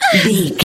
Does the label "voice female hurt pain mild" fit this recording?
Yes